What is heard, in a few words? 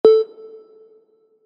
countdown bip